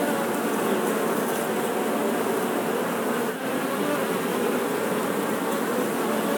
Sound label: locust